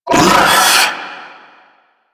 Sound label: Swarm Vocal; Warframe; Man In The Wall; Limb Vocals